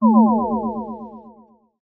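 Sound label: cartoon sci fi laser beam ray high pitched; zapsplat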